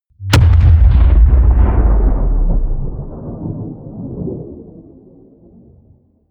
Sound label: explosion